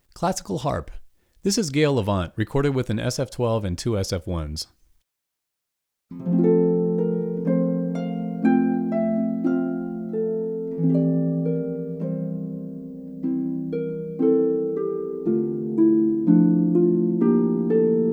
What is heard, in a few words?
Harp